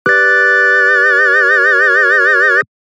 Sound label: Analog Funky Tonewheel; jup8